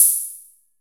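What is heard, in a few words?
NORD open hi-hat, TECHNO; NORD OHH; Drums In Your FACE!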